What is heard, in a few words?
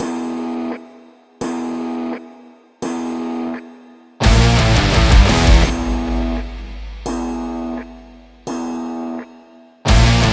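Underground Vibes, sport and action music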